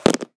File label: bottles popping; Sound Design Tools; Raw Foley